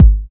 2000s boomy kick drum single hit g sharp key

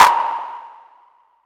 huge clap a sharp key; single hits one shots

